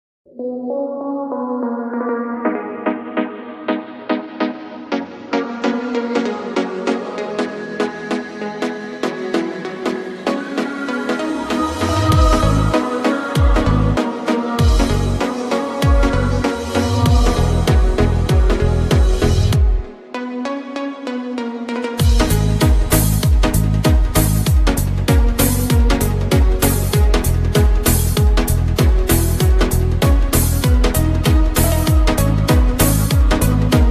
лезгинка ремикс

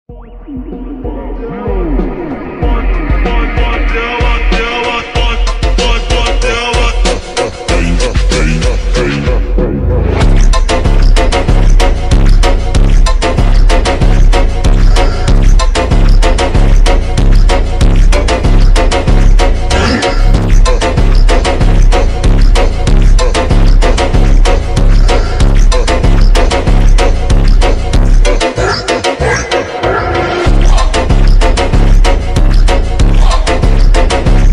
фонк phonk; brawlstar

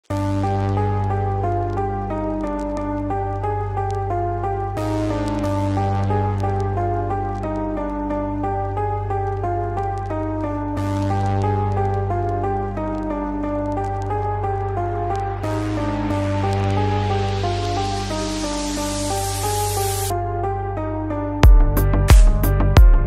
клубная музыка club remix bass boosted

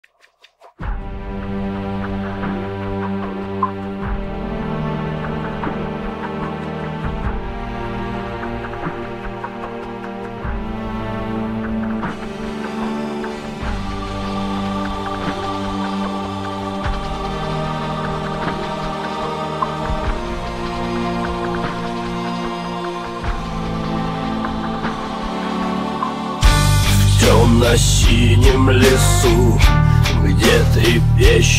эпичный gothic metal; бриллиантовая рука; ai cover

